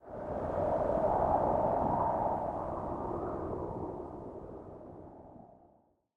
wind medium; nature; draugnorak

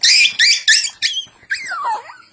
fire, chihuahua; game; critter-cannon; cli-games

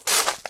gravel; terrain; draugnorak

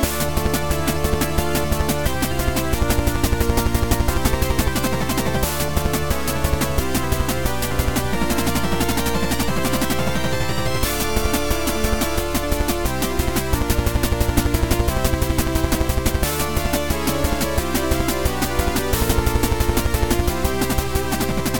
music menu; game; mine-racer; cli-games

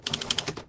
focus mode; cthulhu

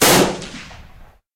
lose turn; game; zombie-dice; cli-games

